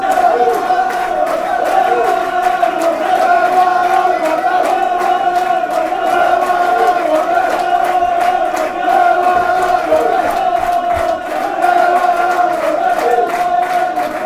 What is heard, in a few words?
bolton chant